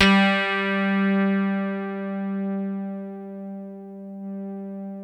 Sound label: DIGI RHODES; Sound Gallery - Charged